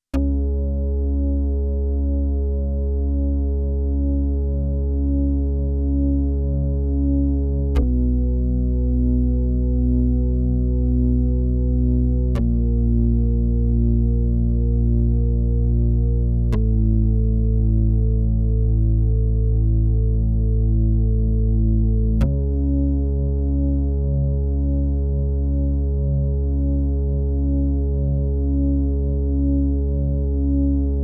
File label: pad; juno6